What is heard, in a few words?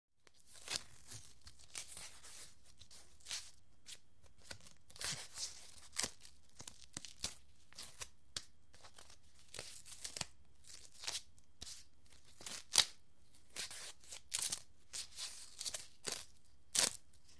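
Athletic Tape Stretch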